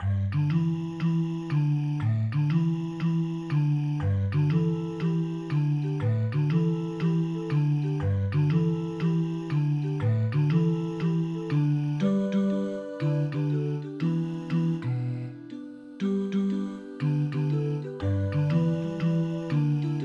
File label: Bass